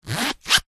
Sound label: wallet coin compartment zipper fast zip; foley; zapsplat